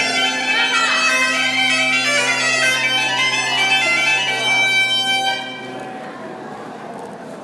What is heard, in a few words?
Bagpipe